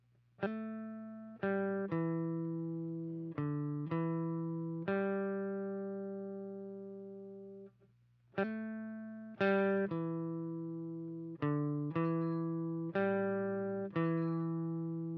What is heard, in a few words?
Guitar MIDI